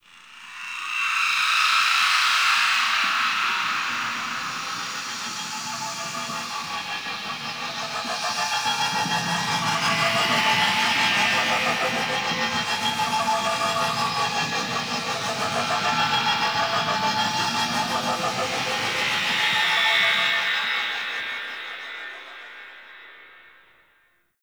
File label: Reaper On Bridge Data Console; Wraith Quest